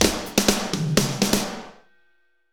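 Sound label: medium tekno, Roland drum beats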